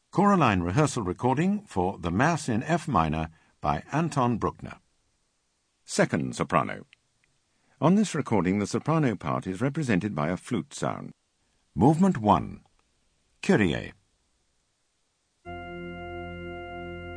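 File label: Soprano; Bruckner Mass in F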